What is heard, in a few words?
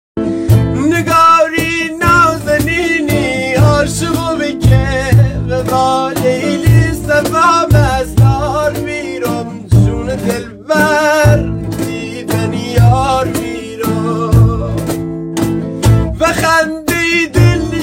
Guitar